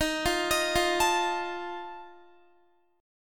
Eb Suspended 2nd Flat 5th Eb Guitar 4F 4A 5C 5G 5C 6E; Ebsus2b5; arpeggiated